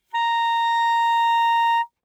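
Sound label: Eb Clarinet fortissimo Bb4 stereo; Woodwinds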